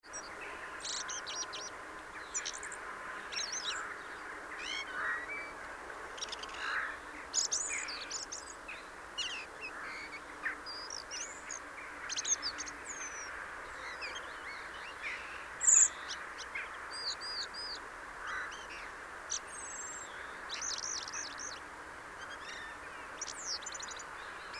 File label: Turdus merula; SETE